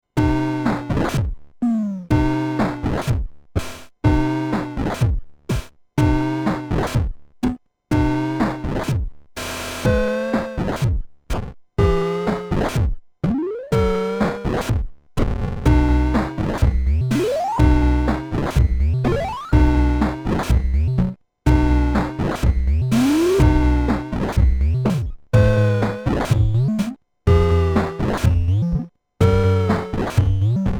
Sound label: Twisted Electrons hapiNES L Duophon out of tune